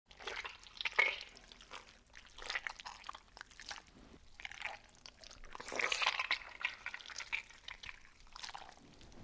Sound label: Volcano Mountains, ambience